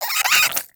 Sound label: Vocal Attack Short; Characters; Warframe; Hunting Critters; Cryptilex